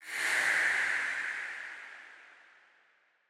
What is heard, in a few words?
ghost; tekton